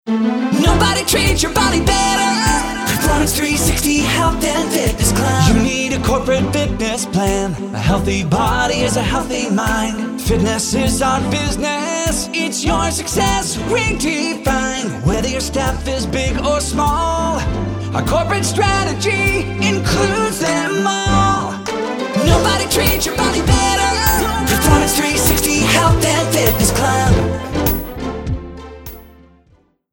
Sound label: Corporate Full Sing, fitness center jingles; Performance360